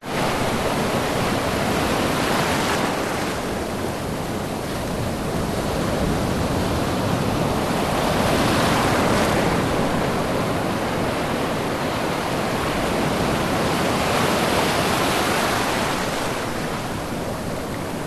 nature ocean waves break high tide sandy beach close in water; zapsplat